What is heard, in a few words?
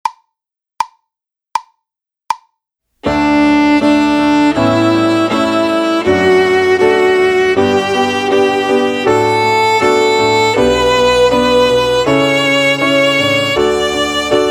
D Major Scale